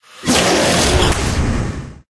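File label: Rat buff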